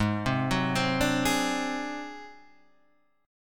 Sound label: Ab 7th Flat 5th Ab down Guitar Standard, Ab7b5 chord; Ab7b5 arpeggiated; JGuitar